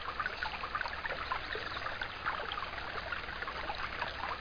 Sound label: Quiet Fountain